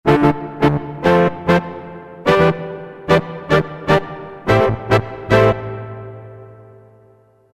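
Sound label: Brass Section, Roland MT-32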